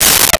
Space Gun, Science Fiction

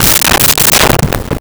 Dull Wood Hit Bounce; Vintage Cartoon; Drums & Percussion

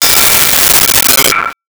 Bell, Metal

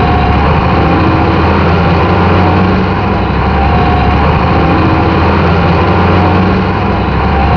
Tank, motoren

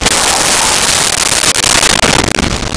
LoFi Synthetic Lion Roar; Vintage Cartoon; Comedy Vocals; SONY PICTURES SOUND EFFECTS SERIES

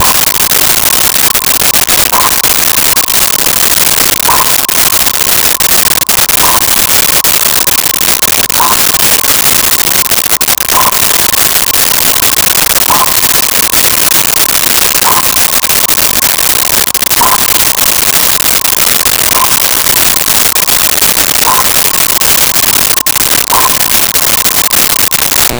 Submarine Sonar Ping Classic; Vehicles; Watercraft; SONY PICTURES SOUND EFFECTS SERIES

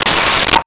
Metal Slide

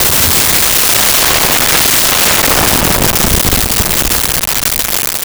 Anti-Tank, Weapons and Mass Destruction

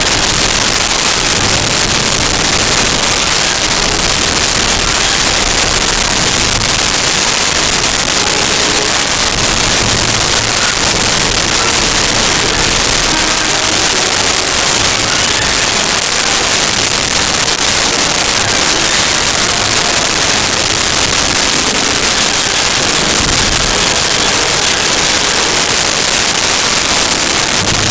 escape loop, Music

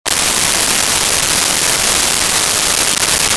Force Field Pulsating, Science Fiction

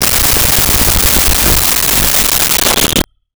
Space Age Motor Cycle, Science Fiction

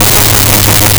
100 Hz Tone at -6db; Test Tones; 1001 Sound Effects

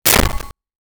tape recorder close

